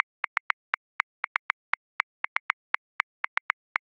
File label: Reggaeton, Drum Booth, Grooves; Ableton